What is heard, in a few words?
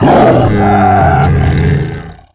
die; Quake 4 Alpha; strogg tik